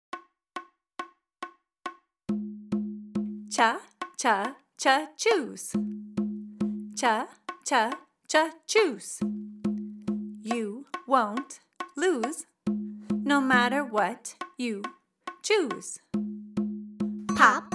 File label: Choose Chant